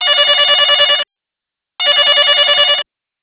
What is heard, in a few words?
Tone; Sanyo; SCP-6600 Katana